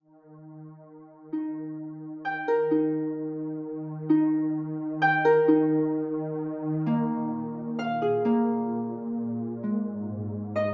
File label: Harp; LG K92 5G; ringtones; Harp from the Moon